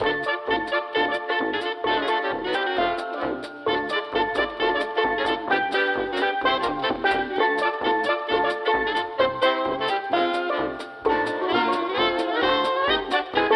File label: boombox